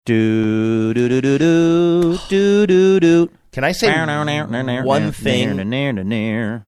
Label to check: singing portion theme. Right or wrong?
Right